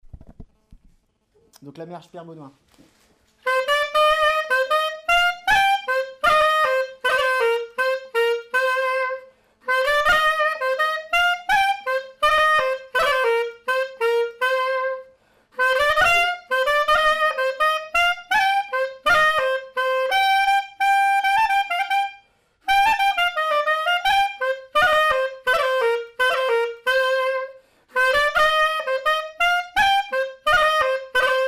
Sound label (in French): clarinette